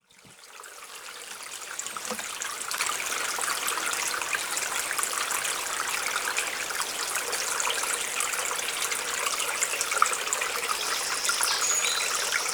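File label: forest sounds by the stream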